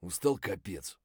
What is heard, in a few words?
tired, voice; Escape from Tarkov